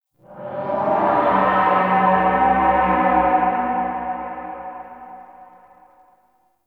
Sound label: Town Distant Horn Alarm; Ambience; Gameplay; Duviri